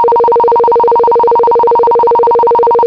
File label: Tone Bell